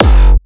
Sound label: Distorted Kick F0